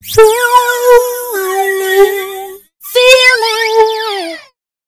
Stereo Flanger Female Vocals Wet Jet Plane Takeoff Flange; Sonicircuit; Syntheway